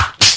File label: swish and smack